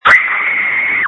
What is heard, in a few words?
screams